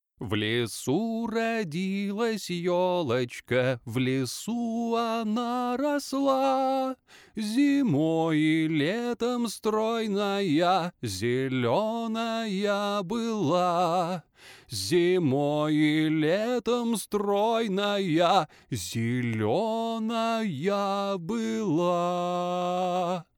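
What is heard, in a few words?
Russian, male, singing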